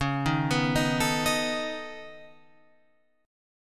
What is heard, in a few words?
Db 7th Suspended 2nd Sharp 5th, Guitar, Open F; arpeggiated; 13,10,11,10,12,10; JGuitar